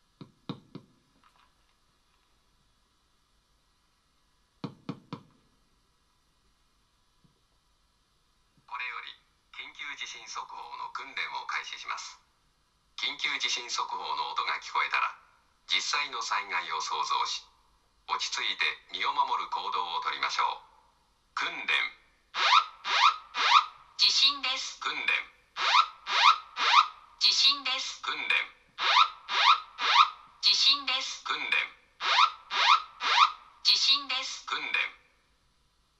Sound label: Evacuation Drill, soundscapes; 地震発生; 訓練放送 （その２）; 避難訓練; コロナ禍での避難訓練．切迫感がない．